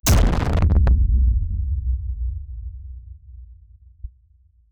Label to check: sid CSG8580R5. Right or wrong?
Wrong